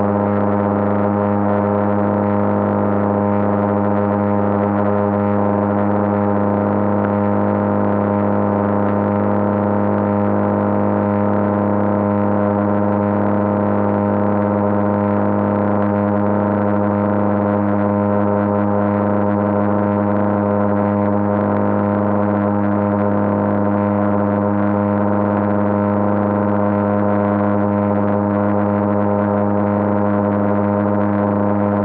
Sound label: perturb 77.5 KHz AM, Perturbatii LF; dcf77 vlf